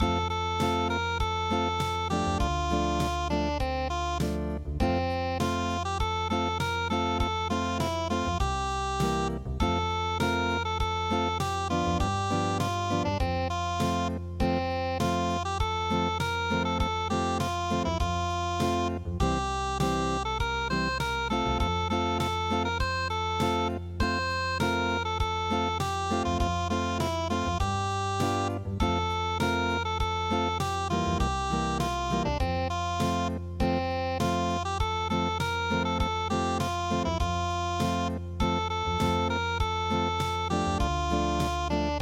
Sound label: unpluged; Carry Me Back To Old Virginny